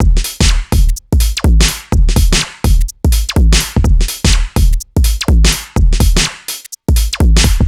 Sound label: Full Loop 125, Loungin House; Equipped Music